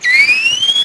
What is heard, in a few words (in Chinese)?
海豚 攻击; 红色警戒2; RA2; 作者：西木工作室